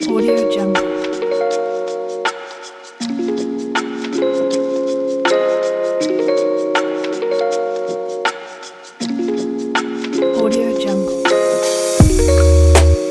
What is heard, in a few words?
lofi beat